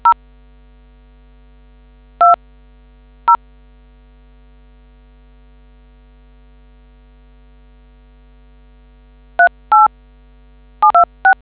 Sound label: dtmf